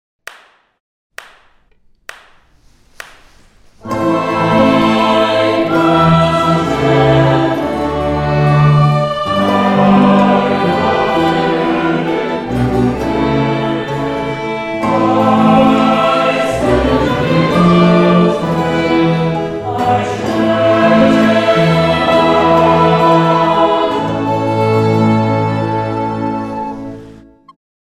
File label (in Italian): coro